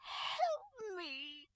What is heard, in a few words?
take rose